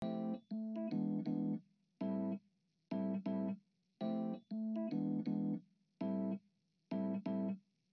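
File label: amp wet epiano, Hybrid neural guitar overdrive; Morphdrive; Demos for hybrid configurations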